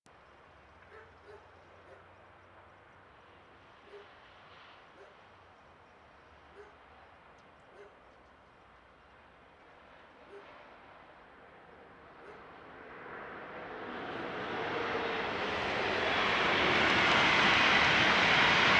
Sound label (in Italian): Treno mono; Eigenmike; A-weighted; Nuova Strada